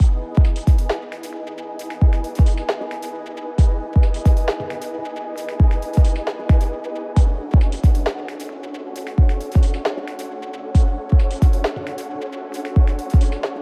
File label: Electronic Ambient Zen; Electronic Ambient Music Pack